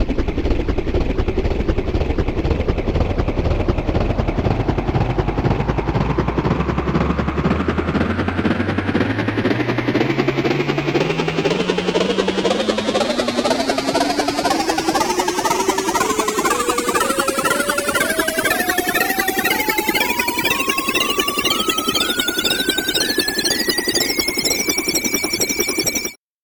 helicopter tonleiter

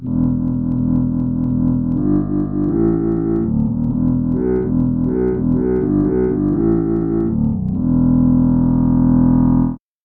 english horn bass

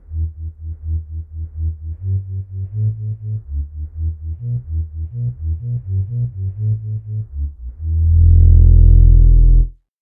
recorder; bass

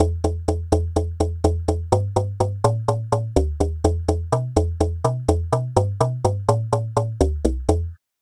agogo bass

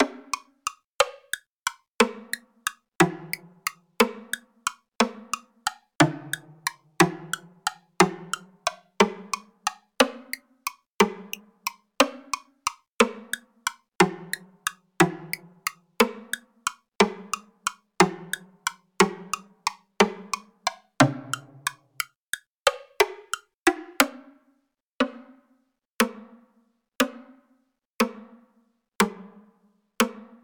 woodblock

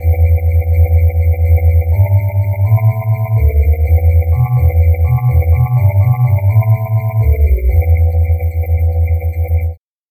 metal pad bass

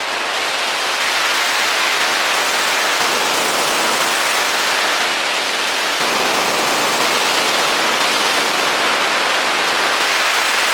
applause

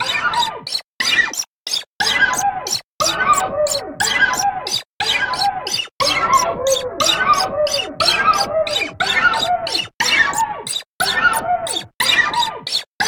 fret noise

